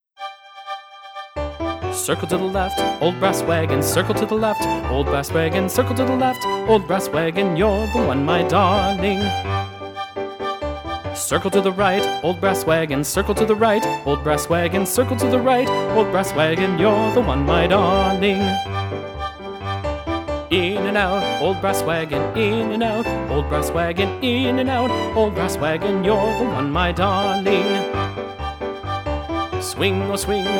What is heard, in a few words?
Old Brass Wagon vocal